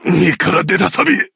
hurt vo japanese; kenji; 健次

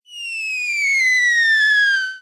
whistle

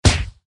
no weapon atk hit

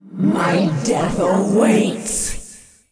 Banshee warcry en; 亡灵

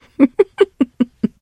giggle; 商人杰恩（七日杀）

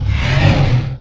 Hippogryph pissed

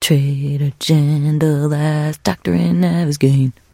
trader jen singing, 七日杀; 商人杰恩（七日杀）

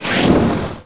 missile

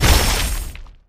might miner atk hit

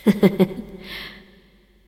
laugh, zh; Anivia

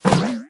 hogrider step

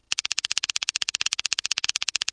Emote Nail Biter Goblin

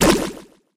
atk hit

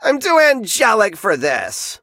hurt; angelic edgar

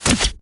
archer attack

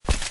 knight footstep

